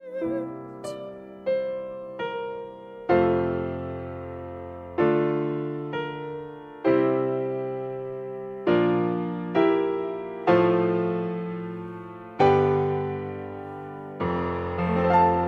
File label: cadences